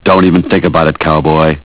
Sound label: mobile polyphonic ringtones